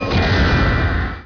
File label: pod flame